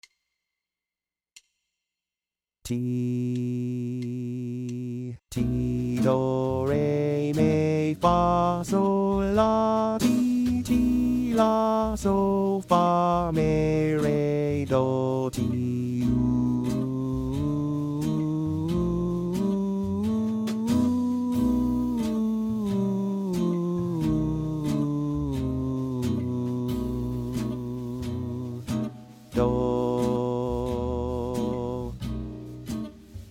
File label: Altered 90